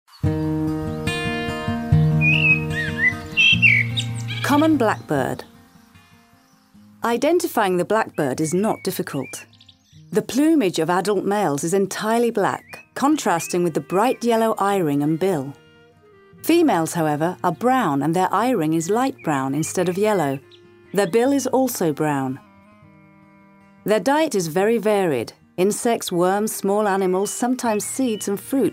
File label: blackbird; birds; lavanderas